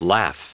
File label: laugh